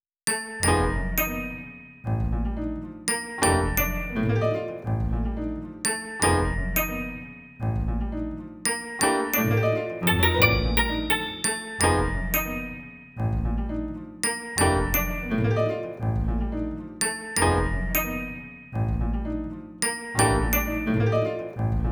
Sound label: Melody